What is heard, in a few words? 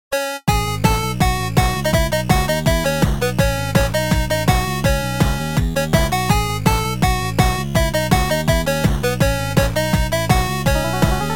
8 bit